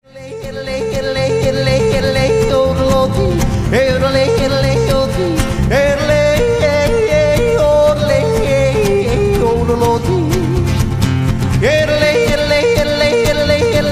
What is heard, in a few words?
yodel song